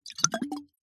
food drink alcohol spirit bottle tilt movement splosh recorded over open top